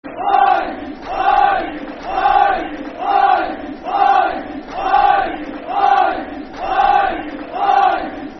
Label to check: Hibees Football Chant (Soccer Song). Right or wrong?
Right